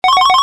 Alert

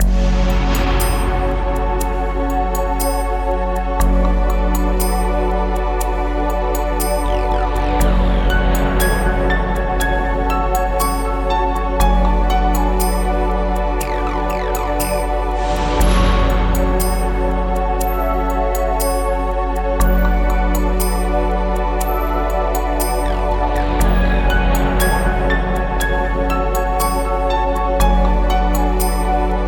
Space Game Theme Loop

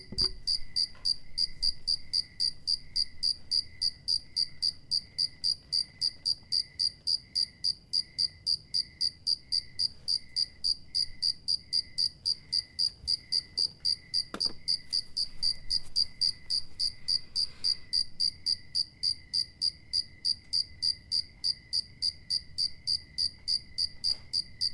Lone Cricket With Other Background Crickets

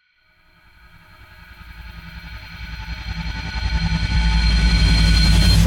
Jingle Riser

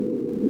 Sfx Processed White Noise

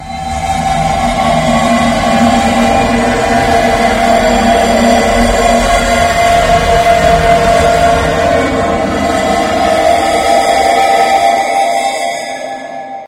Distorted tone mixdown madness

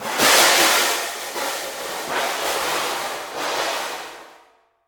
Crashes Mix

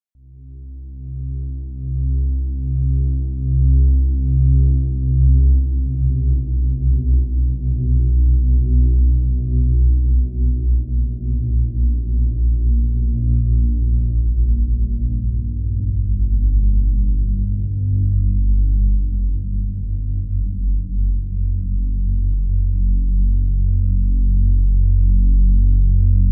Descending Double Bass

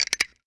Spring

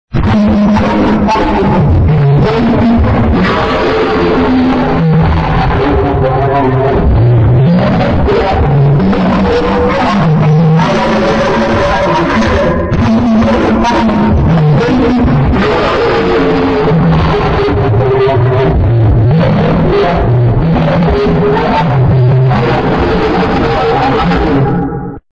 Bacteria Backrooms Scream

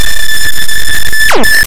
Glitch

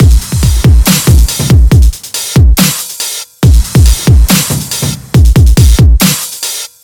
Dubstep Loop

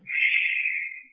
Shhhhh

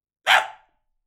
Small Dog Bark

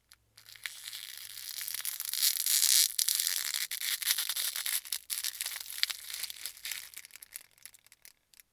Cellophane

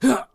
Male Hurt

